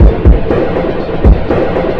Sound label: drum loops; bb drum loops